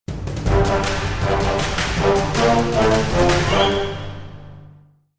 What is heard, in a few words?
MUSIC Victory